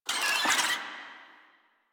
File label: Infested Ashen Vocal Pain; Warframe